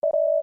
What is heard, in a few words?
computer beep; Trek